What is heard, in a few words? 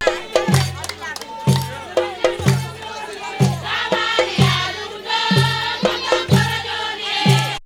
DANCE, ETHNIC JOURNEY, AFRICA; Best Service; Sound Cube